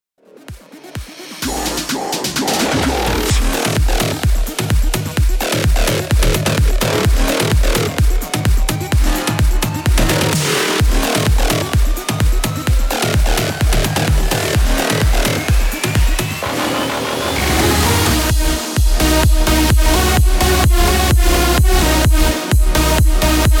128 BPM

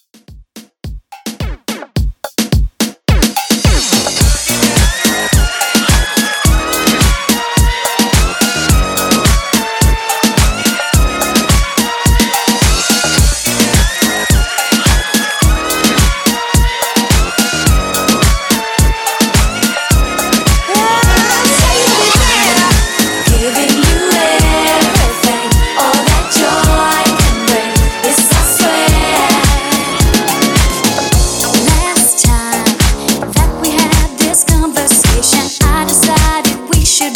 ReDrum 107Bpm

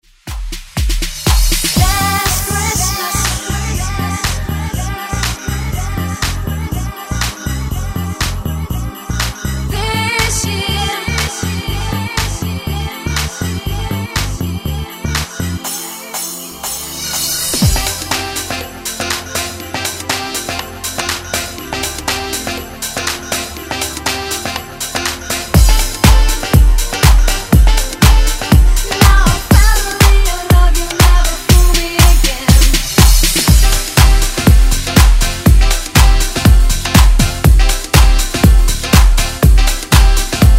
90s Dance Redrum

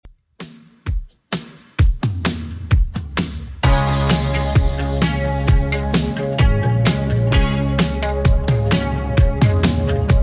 80s Redrum 130bpm CLEAN

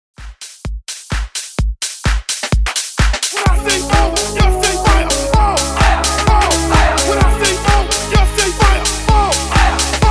Hype 128 BPM; REDRUM

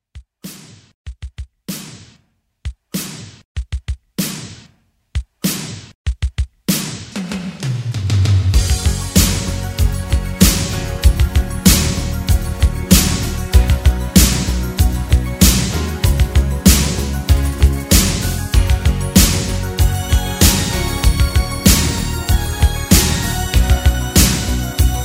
80s Quick Re drum 96

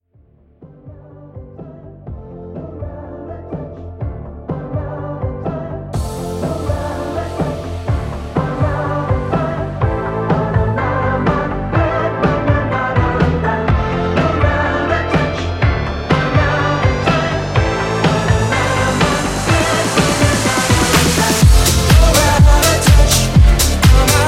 80s Pop Rework

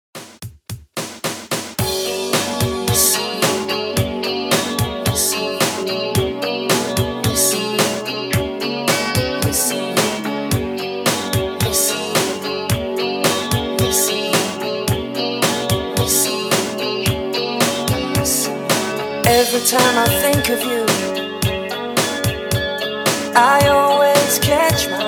Classic 80s Re Drum 110 BPM

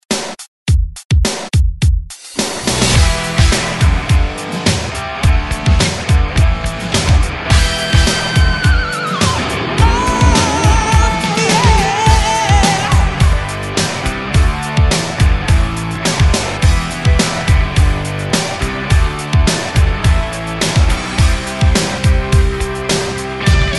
Rock ReDrum, 105 Bpm; DJDOLLS

